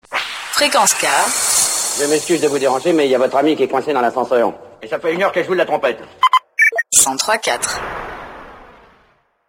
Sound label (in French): ASCENSEUR TROMPETTE; JINGLE; NOEL; radio